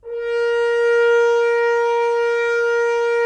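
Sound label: Solo French Horn, brass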